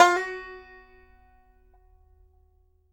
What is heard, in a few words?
BANJO NOTES; Sampleheads; Whole Lotta Country 1 & 2